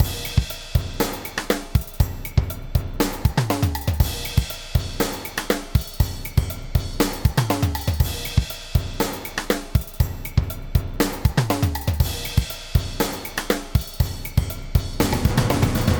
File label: Drums Set Cinematic 120